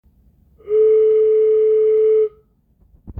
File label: Whistle